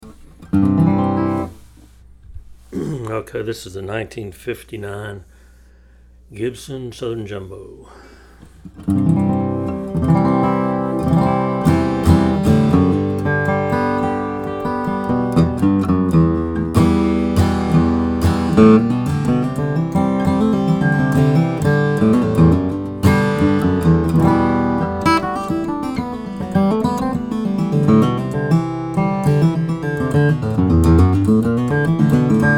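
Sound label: 1959 gibson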